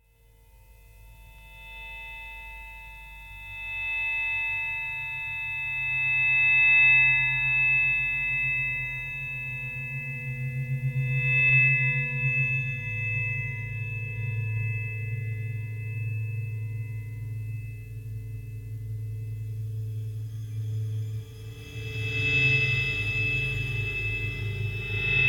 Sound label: Shiver